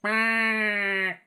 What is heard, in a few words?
Fark bird; SyrinScape; Syrinscape unplugged; Jungleplanet dinolaser battle